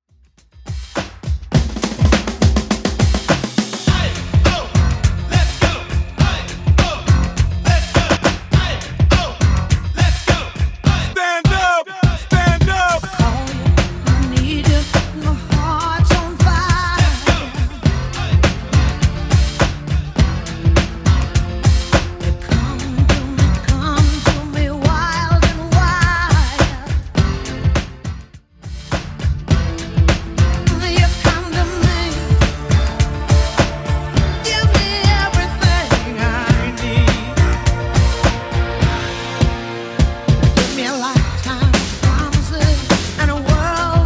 80s Mixshow 103bpm

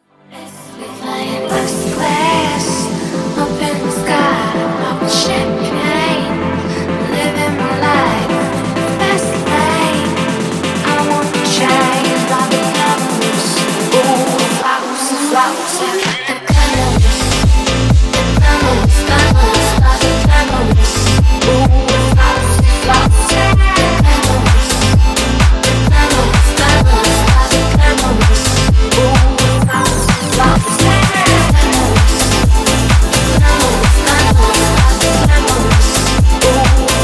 Funk Bootleg 128 Bpm

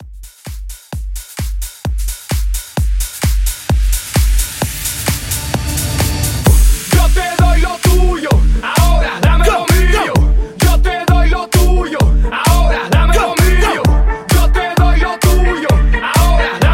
House Mixshow Edit 130

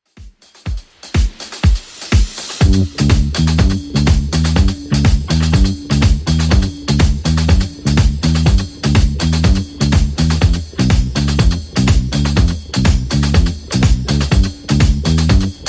80s Redrum 123bpm

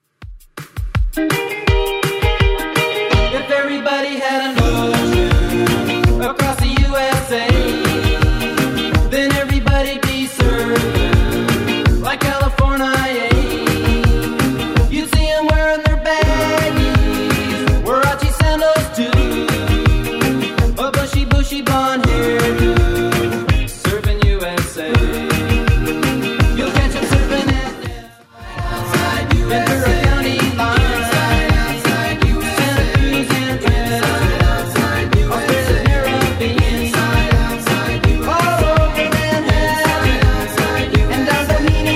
60s Redrum, 165 bpm

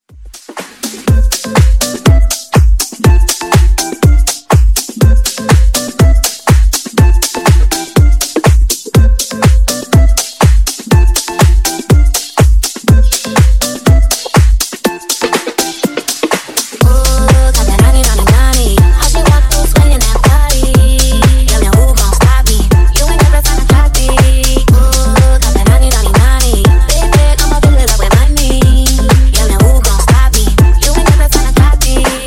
Funk House Edit 122Bpm; Clean Dirty

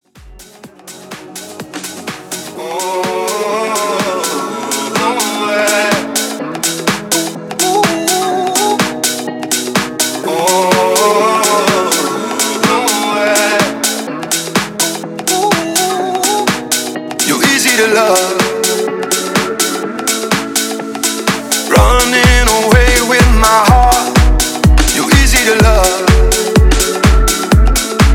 House, 125 BPM

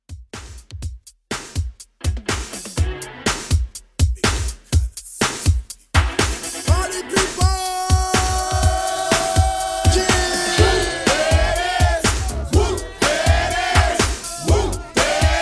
80s Hiphop Hype

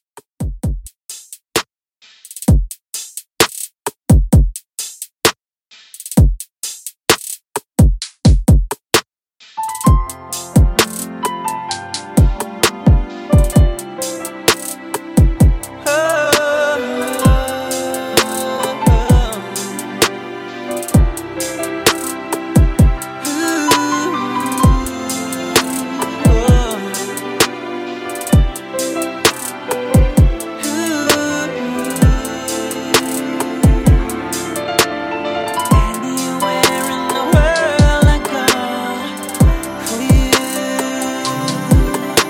Slowmix 130Bpm

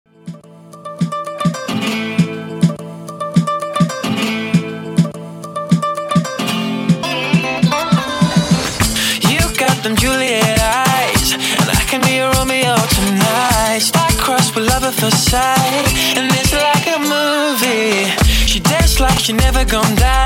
102Bpm Clean

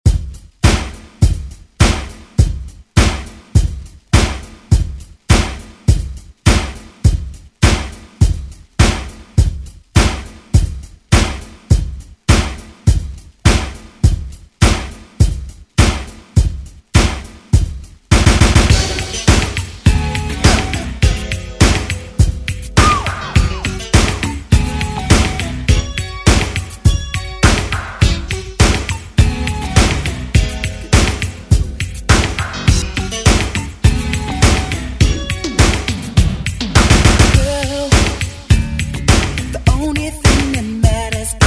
80s Ext Redrum

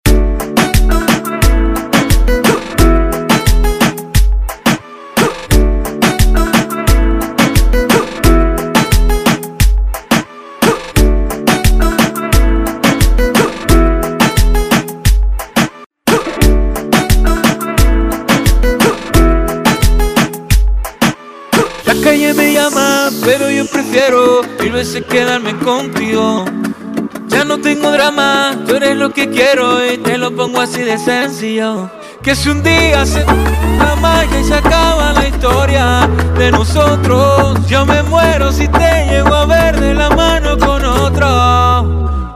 Reggaeton Redrum Intro

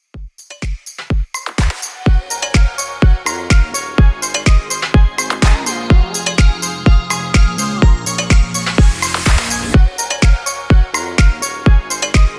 Club Extended Mix 125Bpm Clean

